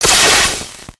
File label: Shock console; foley